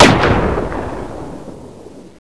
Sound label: sniper fire, weapons